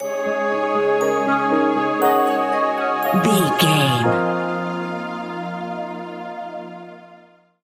enchanted mystical forest stinger

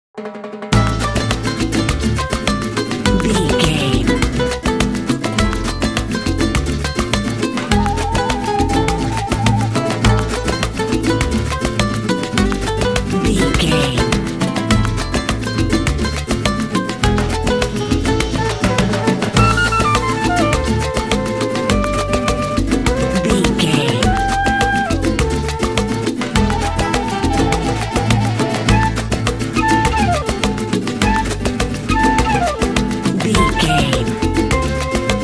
latino tropical

